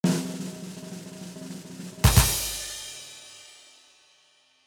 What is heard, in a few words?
percussion